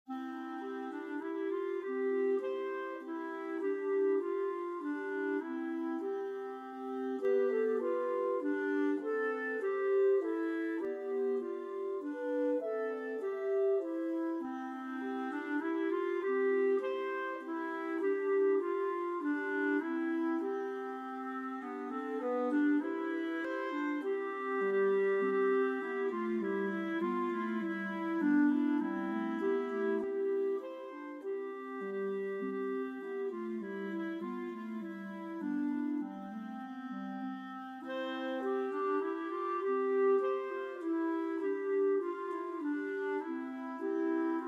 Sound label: Clarinet, Concert C Major; Spring Medley